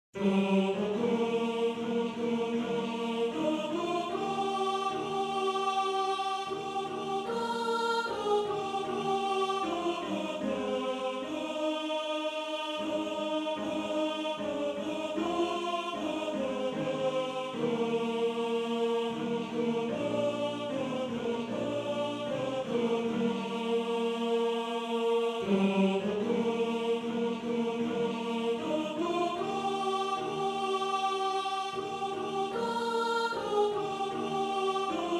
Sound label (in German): Tenor; Tenor 1